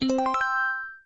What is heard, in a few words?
slot machine win